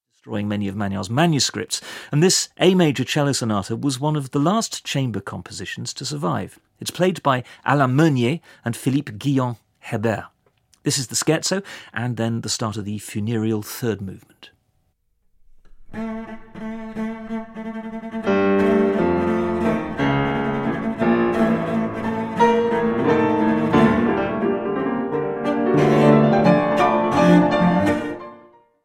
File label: sonata in a major for cello and piano; Albric Magnard; op 20